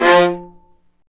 String Sections, Violas Marcato

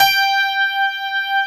DPF G4; AKAI S-Series CD-ROM Sound Library VOL-2

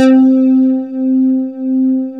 ANALO BAS, ANAL BASS

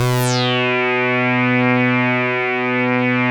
SAW RES DRY, POLYRES SAW; InVision Interactive; Keith Emerson; The Most Dangerous Synth and Organ

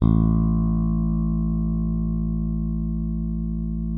A1, FINGER MUTE; Xsample VOL-1

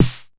909 SD; TR808+909

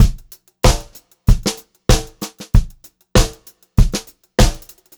BEAT, Pop-Rock Drumloops; USB Soundscan vol.37; AKAI

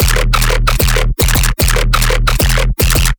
bass loop 150 D#; Virtual Riot

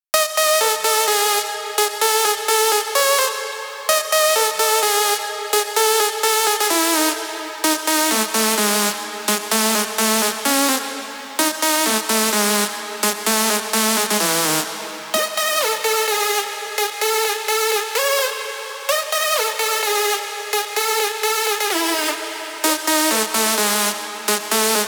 128 BPM Drop leads No SC, Synth, Root G; Vengeance; Essential Clubsounds Vol.5; Demo Constr Kits; Big Line Kit

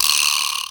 HI VIBRA; Univers Sons; Basicussions

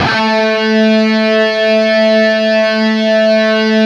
LEAD A 2; East West; Steve Stevens Guitar Samples Collection

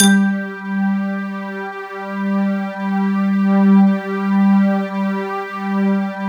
PERCP, PERC PAD; Sound Gallery - Charged

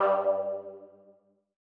Lead G2; KG MegaSaur

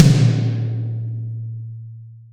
TOMS, Real Mega Drums

